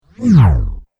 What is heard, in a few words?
Laser Drop Whoosh; Laser Sound Sound Effects